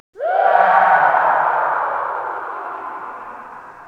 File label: far scream; underhell